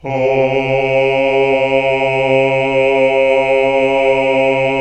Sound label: Basses; Sound & Vision - Gigapack II CD 1 (Roland); HAL Greg. Basses